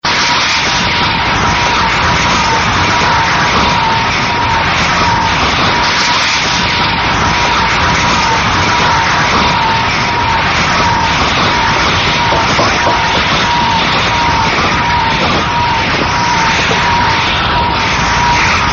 Submarino en inmersión: submarino con propulsión eléctrica, cuya hélice va a 90 r.p.m.; subacuatica; sonidos registrados por un sonar

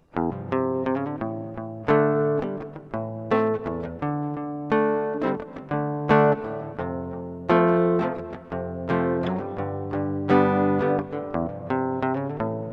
Sample Guitarra Reverb

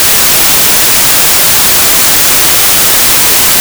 ruido

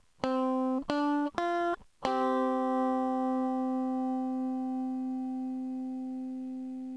limpio, Guitarra

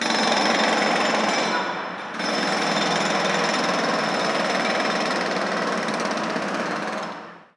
taladradora

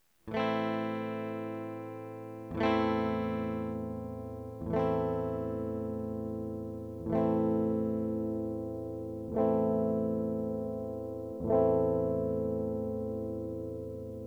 Tono, Guitarra

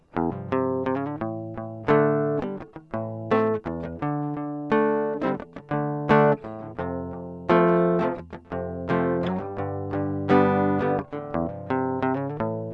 Sample Guitarra 22KHz 16bits

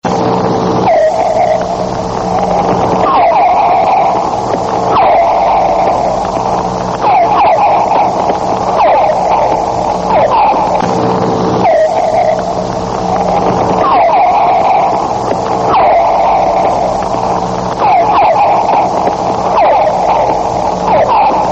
Delfín: sonido biológico.; subacuatica

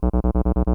CB onda cua

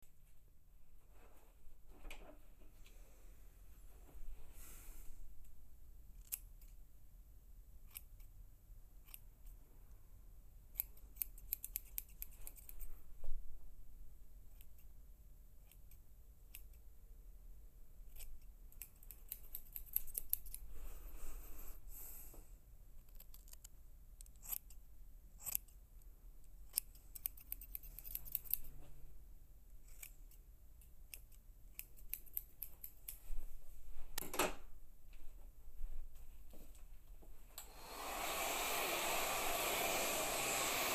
Peluquero; Corte de pelo; Caja de cerillas - holofónico

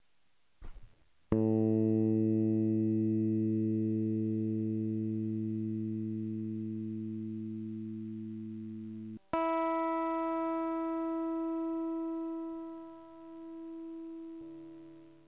limpio, Guitarra

